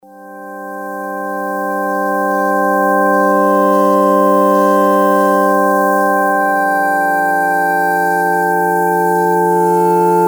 pad sweet, synth roland jd800

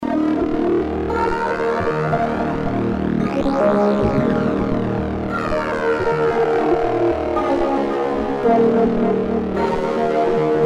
synth layer

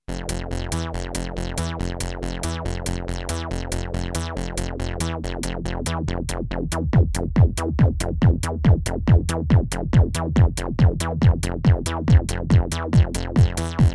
seq, korg ms20 synth; sequence: MS20 + drum samples

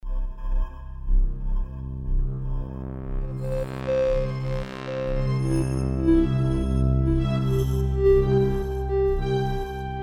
vectorize, yamaha tg33

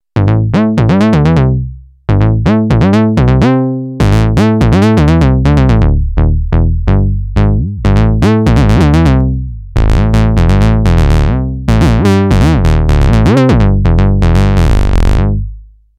bass plastic, Novation Bass Station II; analog monophonic machine with subtractive synthesis

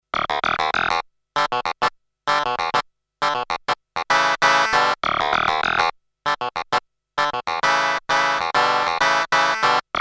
Korg DSS-1

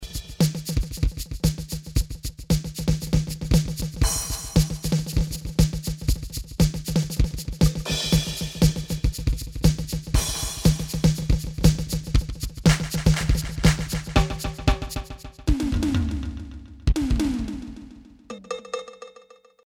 drums, yamaha tg33

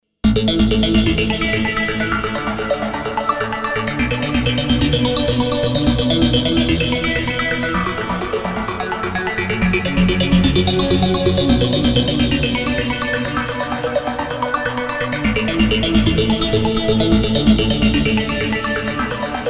korg m1r synth

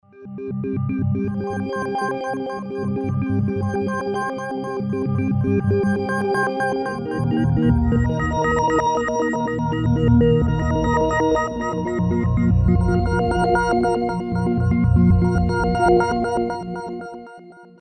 pad JD800, Roland JV880

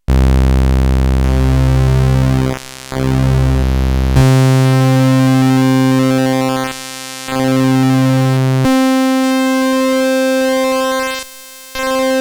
saw width, synth Roland alpha juno

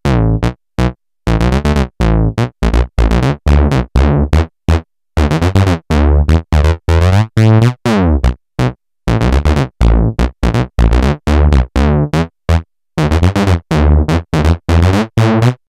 synth minimoog, Moog Minitaur

